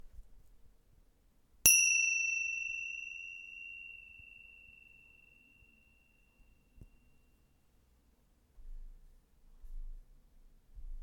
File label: Chimes 5E